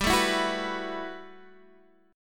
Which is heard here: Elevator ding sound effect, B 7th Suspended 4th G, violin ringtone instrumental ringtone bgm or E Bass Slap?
B 7th Suspended 4th G